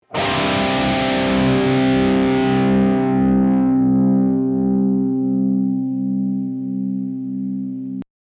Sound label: Power Chords, guitar